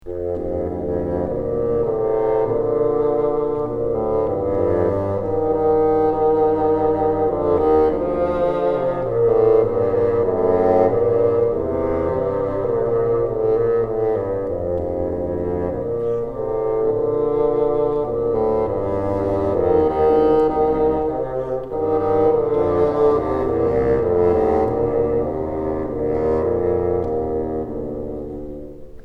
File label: together, bassoon